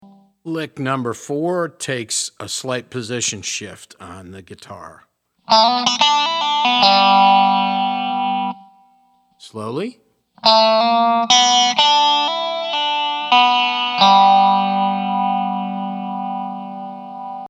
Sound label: resonator pedal, steel guitar